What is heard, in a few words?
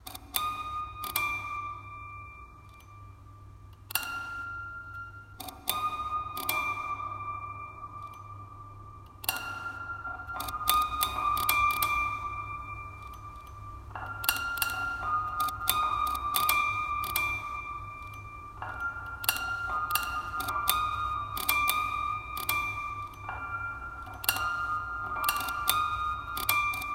minimal music